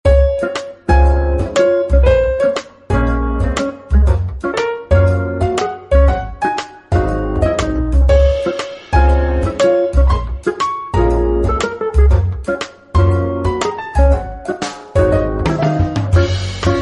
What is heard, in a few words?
Lobby Background Music